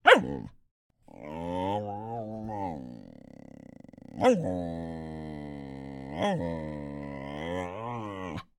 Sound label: bark, vocalization, light, close, complaining, choppy, elongated, teeth clicks; Eiravaein Works; Epona